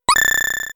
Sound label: item get; svencoop; bman